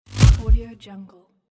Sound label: electric hit